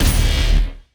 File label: Gauss Cannon Electric Arc; Elecarc